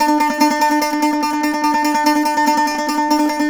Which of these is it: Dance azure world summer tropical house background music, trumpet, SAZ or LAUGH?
SAZ